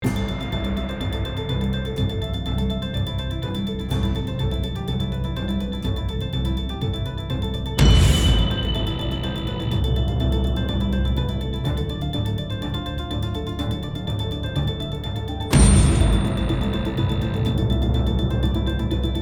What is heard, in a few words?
Action loop